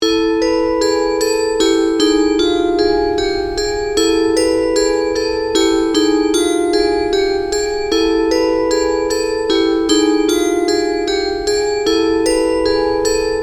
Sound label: Loop Bell BPM152; ループ素材３曲セット 2